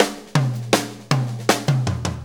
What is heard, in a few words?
Blues Fill; Sonic Foundry (Sony Creative Software); Drum Tools